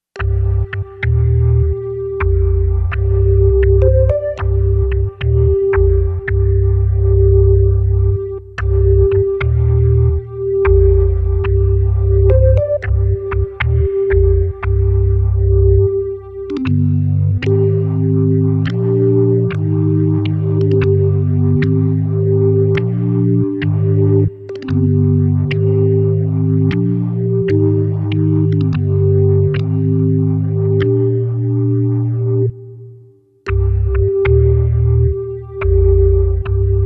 blues in C minor

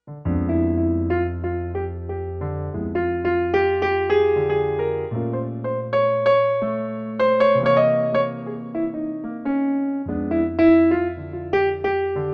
f minor blues

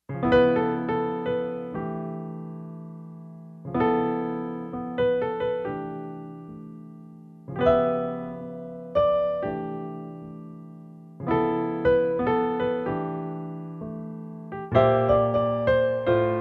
ballad in C major

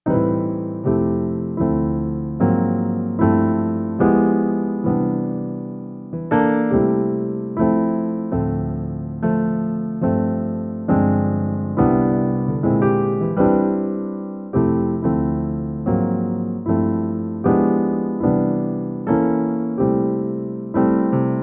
big band sound in c

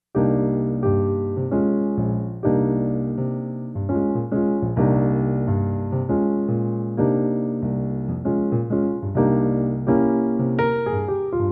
flamenco time

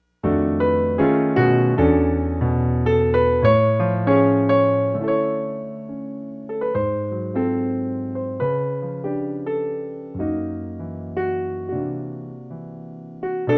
minor blues in f#

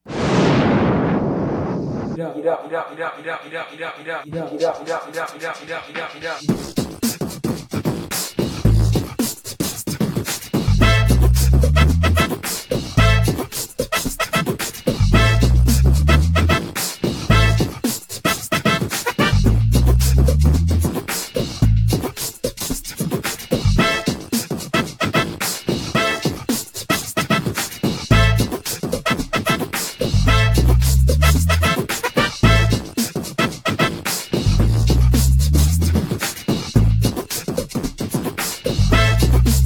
the beatbox

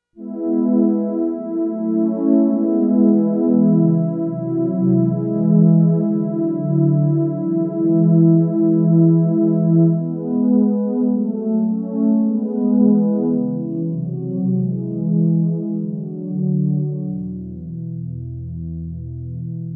string quartet

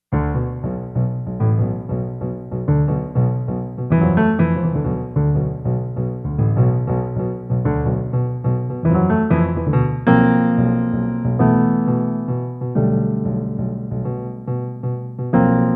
riff

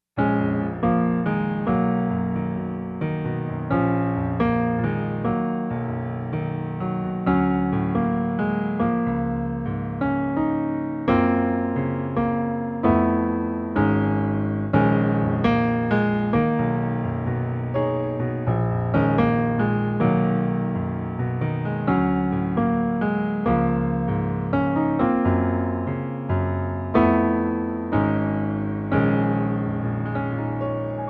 black key power groove

